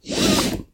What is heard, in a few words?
seatbelt; car